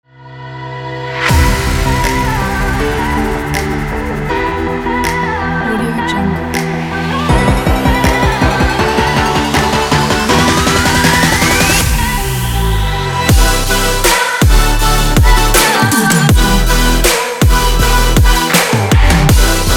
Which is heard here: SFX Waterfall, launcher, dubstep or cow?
dubstep